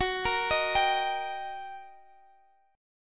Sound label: G Minor Major 7th Sharp 5th, Gb, guitar chord